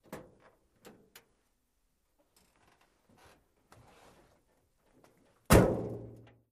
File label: Fire Truck Door Med